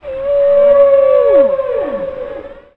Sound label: whale